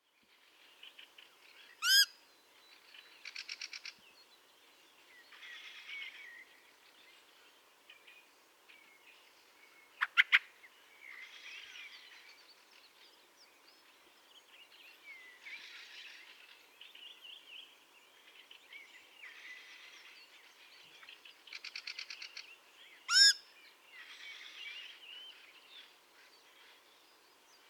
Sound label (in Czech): drozd kvicala Turdus pilaris